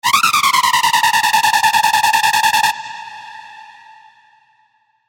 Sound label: Screech; revealed serum hardstyle vol 2